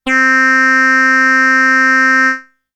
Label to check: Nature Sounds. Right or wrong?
Wrong